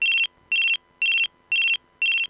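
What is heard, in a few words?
keitai, mobile phone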